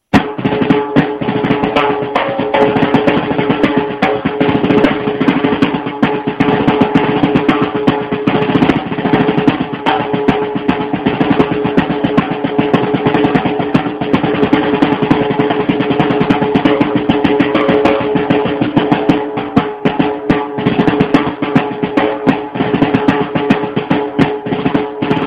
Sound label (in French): instruments caisse claire